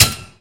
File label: switch press, buttons